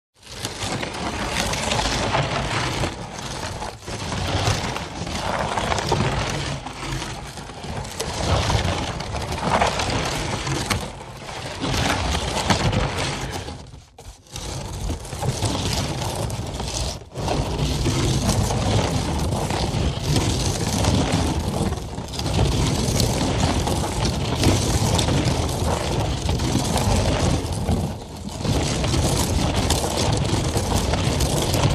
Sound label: Grinding on hard surface